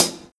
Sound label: Hi Hat Closed; pitchglitch samples